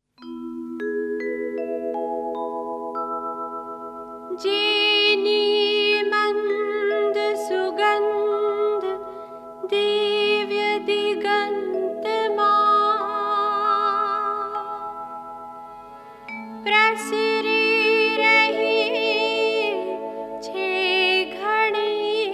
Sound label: Shlok